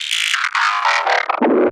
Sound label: 140 FX LOOPS